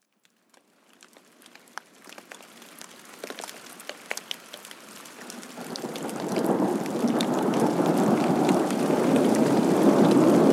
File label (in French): Pluie orage mouche